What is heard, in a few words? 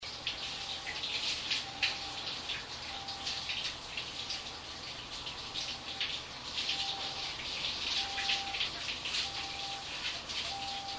to siren small in 30min